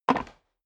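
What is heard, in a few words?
step boots wood creak jump, footsteps; schritte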